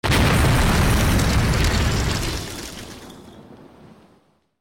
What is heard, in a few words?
other destroyed, ships combat